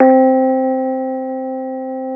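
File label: AMP FENDER C4, Keyboards Old School; USB Soundscan vol.09; AKAI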